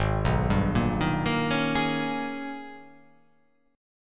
G sharp Minor Major 11th

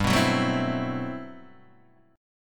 G Major 13th, Guitar DADGAD

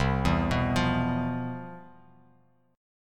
C Major 11th C; CM11 arpeggiated; JGuitar

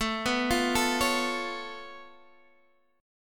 Db Augmented 7th A

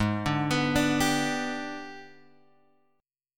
G sharp Minor Major 7th Flat 5th, Guitar, Open G; 6,7,9,7,8,x

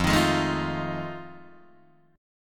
F 7th Sharp 9th, Guitar, Open D

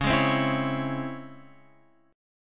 Db Minor Major 7th Db down Guitar Open F; DbmM7 strummed; JGuitar

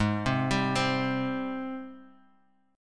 Ab Major 7th Ab down Guitar Drop D; AbM7 arpeggiated; JGuitar

